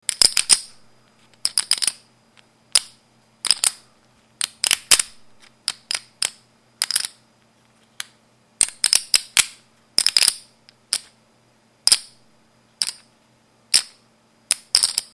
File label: Poker Chips, Casino; el colmillo de cobre